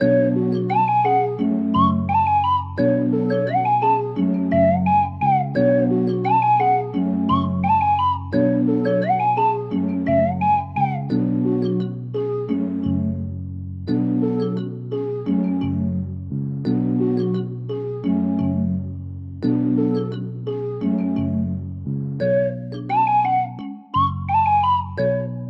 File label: 173 BPM F# Min, Melody; Cymatics; 2022 Melody Collection (BETA)